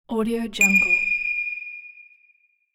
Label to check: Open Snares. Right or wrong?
Wrong